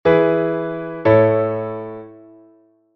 cadencia autentica

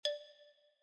re, Xilófono; Xilófono con dúas baquetas á dereita; Proxecto cREAgal

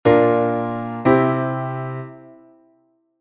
semicadencia

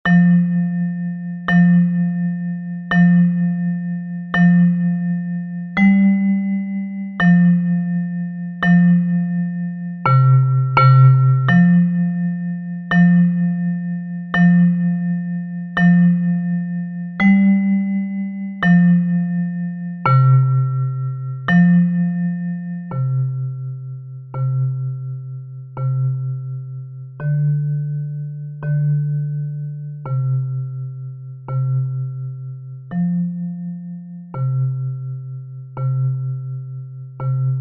Bo Nadal Metalofono Baixo

